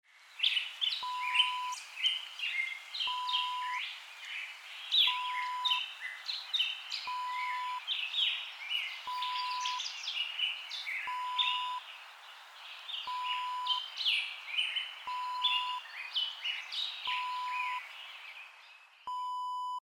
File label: bird singing